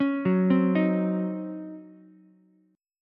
C sharp Major 7th Suspended 2nd Suspended 4th Ukulele; C#M7sus2sus4 arpeggiated; JGuitar